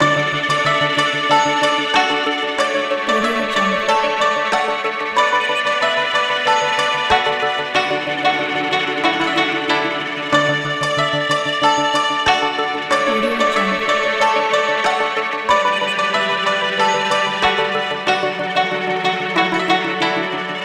fighting game soundtrack loop hunting tigers no drums ener; energetic calm